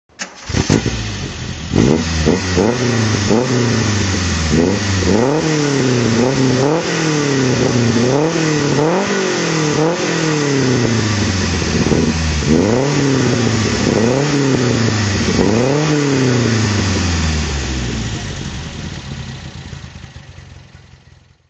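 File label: alfa romeo 1600 spider tuned throttle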